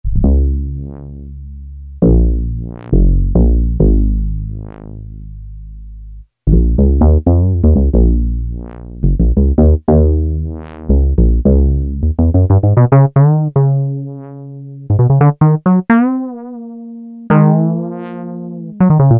BASS 2, Yamaha DX7

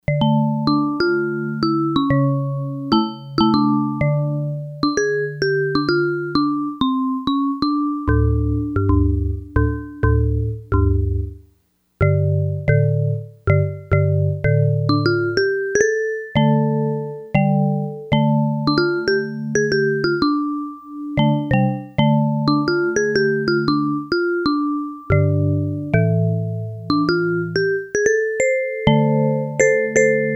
Yamaha DX100; Good Vibes; Factory Patches

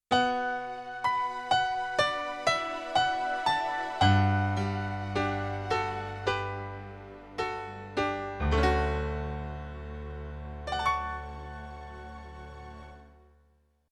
Strings & Piano, Yamaha TX16W; Europop Performance 1

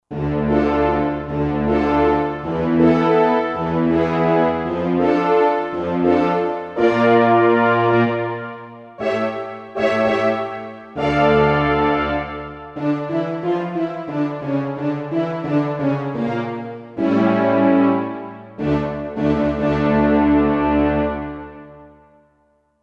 Orchestral Brass, Roland SR-JV80 Orchestral

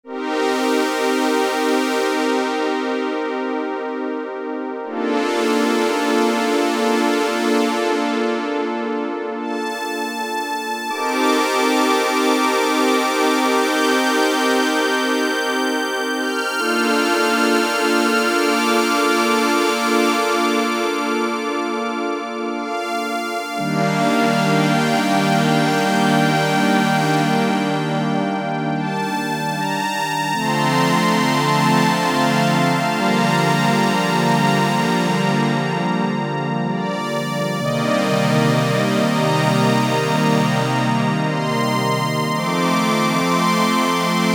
HIGH STRINGS, Oberheim OB-8; Factory Patches

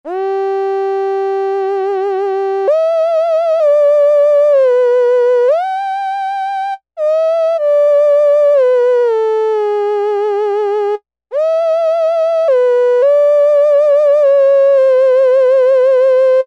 alto, Korg M-500; micro-preset

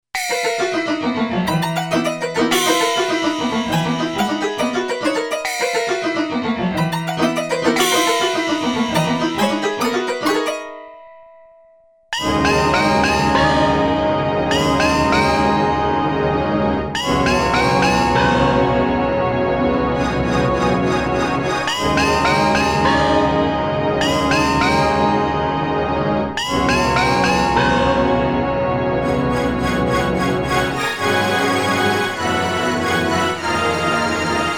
Unprepared Piano Opera Gong song

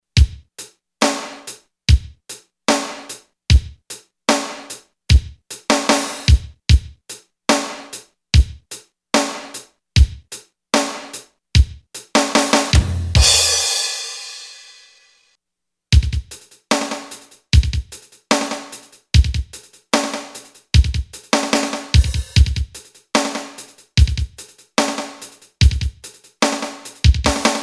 DELAY L,R, Yamaha REX50

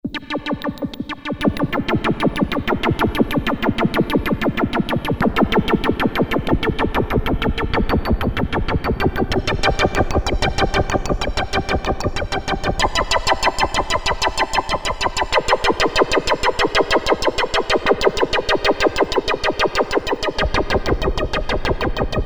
POLY RHYTHMS, Roland Jupiter-8, Factory patches

